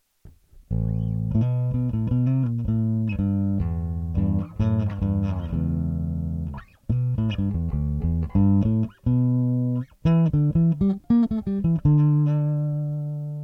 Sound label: ACTIVE neck, bass; electronics; SESSION